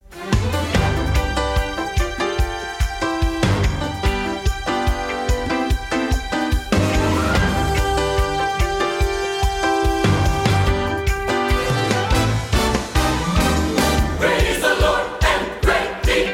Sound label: Radio Spot Music; NCC Tampa Tour